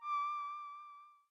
bubble

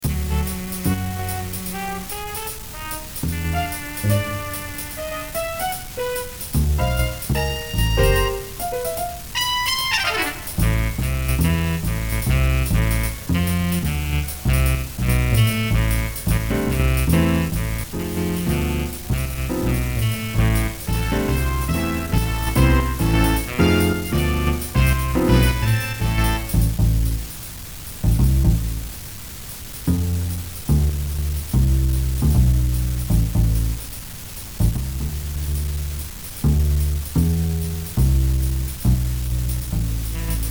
Catchy Jazz Song

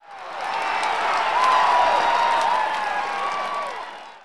Crowd Cheering, Applause

